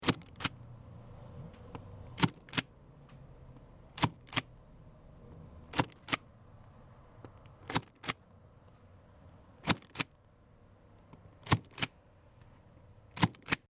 Stempel

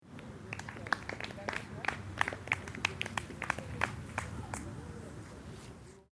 Fussball klatschen

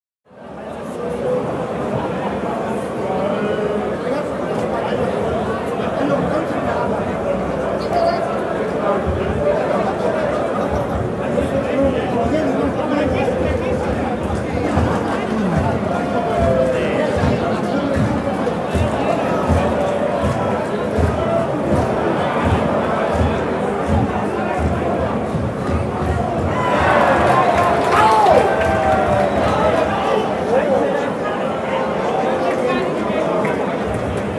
Stadion unter der Tribuene

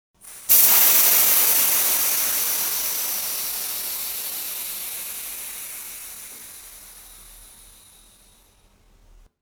Luft ablassen

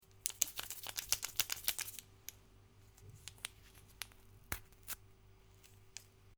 Zuckertuette oeffnen

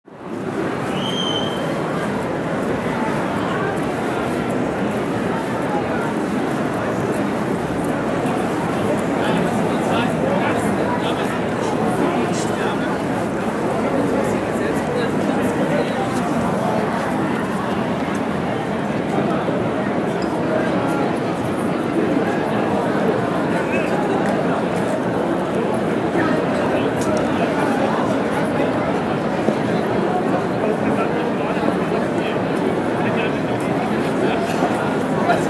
Weihnachtsmarkt

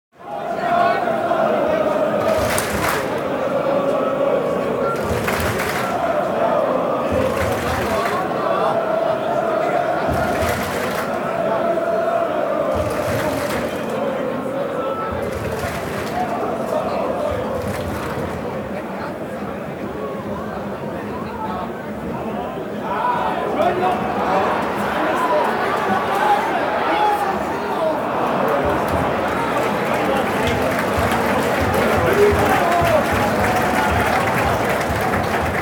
Stadion Fans und Gesaenge

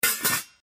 Deckel

